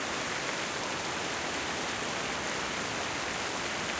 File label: lines rain; Burning Reels; wazdan